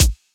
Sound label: percussion; VES1 Percussion